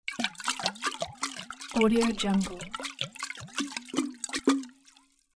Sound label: shake the water in a large glass flask